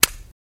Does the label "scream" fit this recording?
No